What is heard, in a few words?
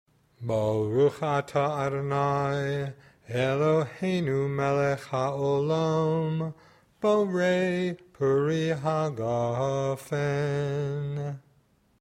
kiddush saturday